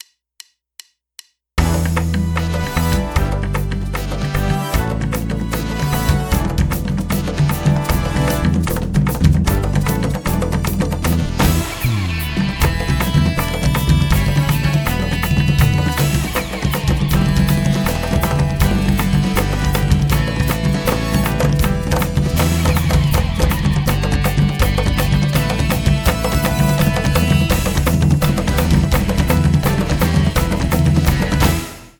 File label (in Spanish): Pista Tambores y Metales; Conciencia Fuerza y Poder; Folklore